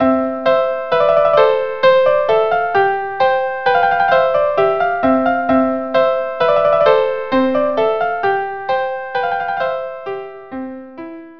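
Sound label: Clarke tpt minuet 2 vlns, trumpet minuet 2 violins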